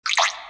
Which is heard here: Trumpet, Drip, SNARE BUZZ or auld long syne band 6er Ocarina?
Drip